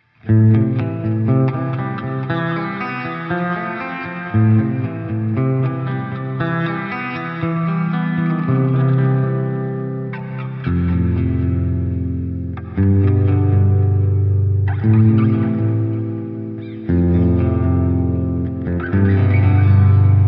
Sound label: Line6 DL4 MKII Delay HARMONY